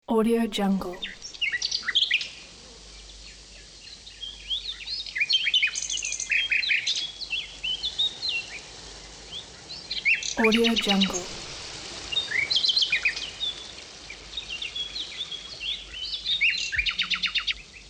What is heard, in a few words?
birds tweeting